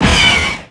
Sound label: blasters; imperial base